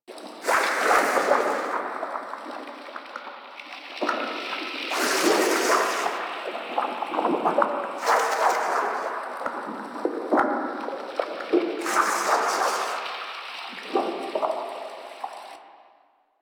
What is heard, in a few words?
Shroom Farm Loop; ambience; props; loops; Warframe; Venus Open World; Venus Landscape